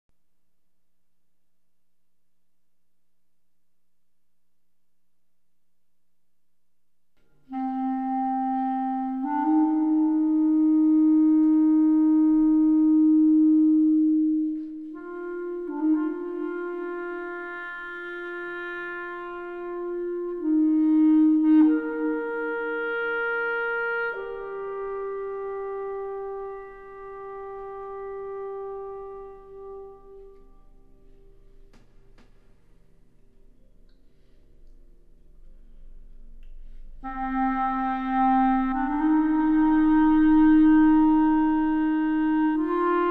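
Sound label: melody; Myst III Exile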